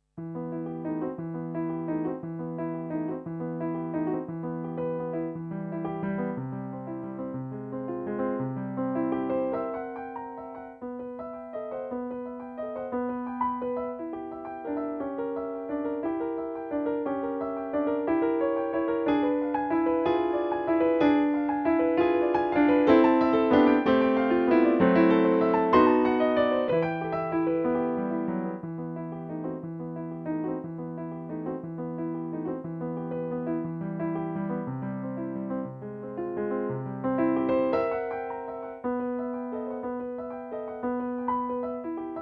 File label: Piano only